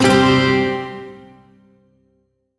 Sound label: Shawzin Chromatic Chord; Warframe; Duviri